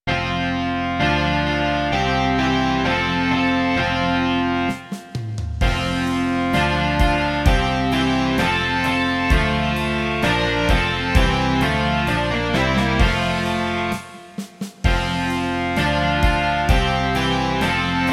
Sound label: rock